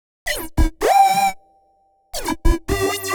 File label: 128 E, uk garage, Synths; Targa; 128bpm Lines n Loops